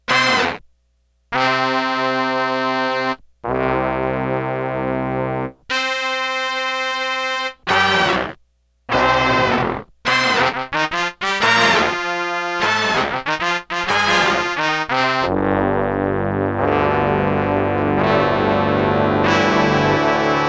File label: BRASS FALL OFF BRASS SECTION